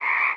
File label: frog